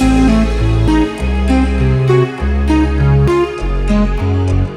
philter (mr scary); Sonic Foundry (Sony Creative Software); Ugly Remnants VOL-2

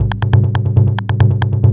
drum, 138, drum-loops, tribal, Techno; Techno ID; tribal-tune

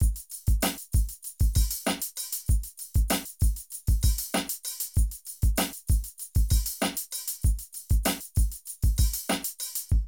Drum loops, Streetsoul

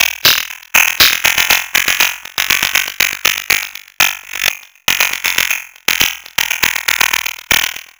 Structured Rhythm, IDM Rhythms

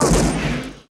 GSHOT, LA GUNSHO; Total Control - Action Cutz

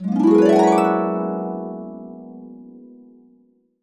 Complete Harps; USB Soundscan vol.62; AKAI

